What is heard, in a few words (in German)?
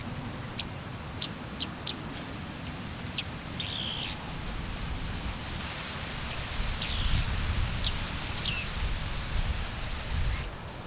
Bartmeise; Mohrweihergebiet ERH; Barbara Goldmann